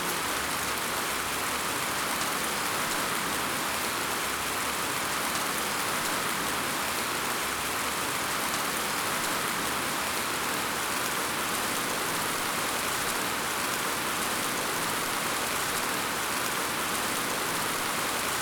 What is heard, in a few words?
Rain Sound White Noise; Nature